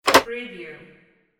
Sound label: button